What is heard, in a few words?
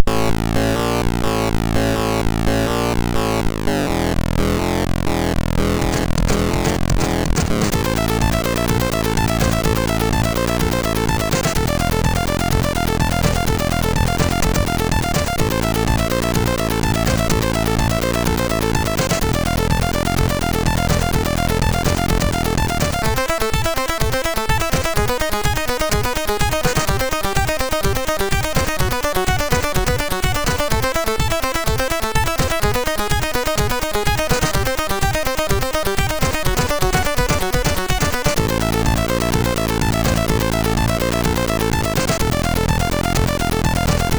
Nice Drum, sid MOS6581R3